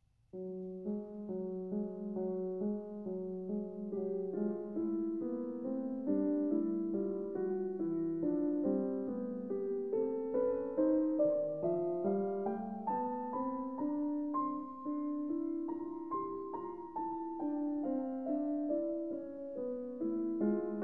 Piano Sonata No. 2, I. Allegretto; Op. 61

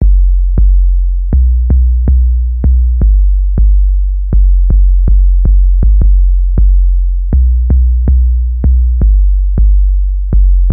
Bass Sequence

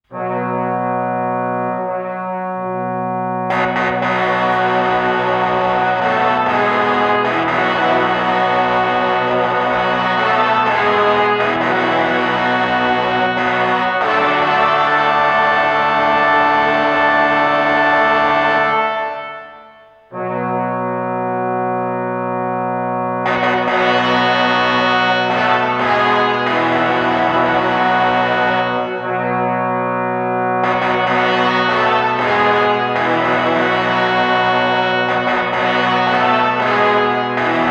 Fanfare

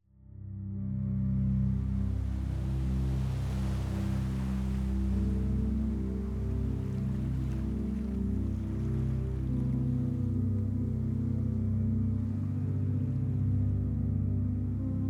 Soundscape

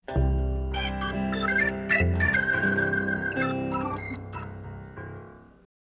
Instrumental fragment

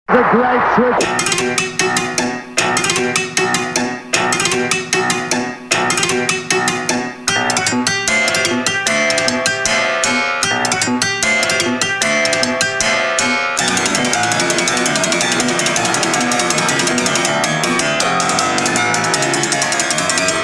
Piano Bit (instrumental)

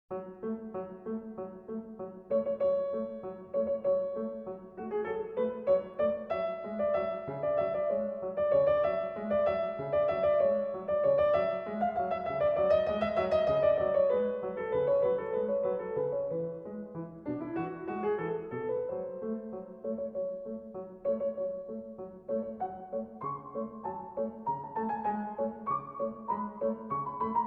Fugue in E major, Piano

